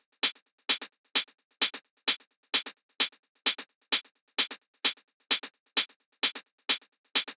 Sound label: Drum Top Loop, Dry, 130